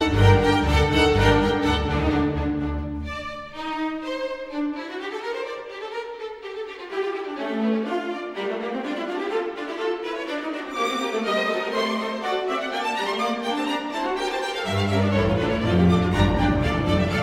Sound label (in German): Sinfonie g-Moll